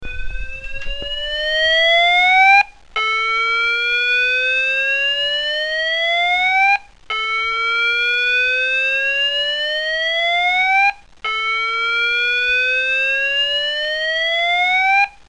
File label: Building Evac